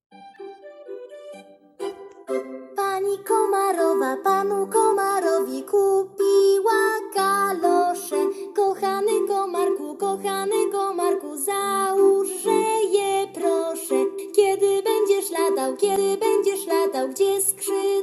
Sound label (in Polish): piosenka z instrumentami